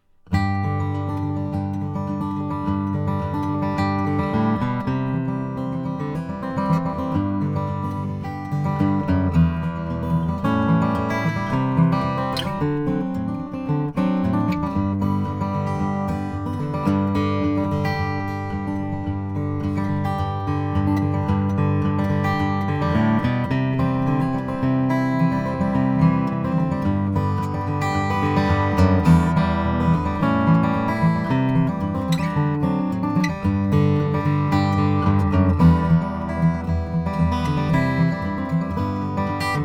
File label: brondel d1 brazilian rosewood